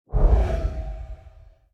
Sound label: basic fire; smite; zhongkui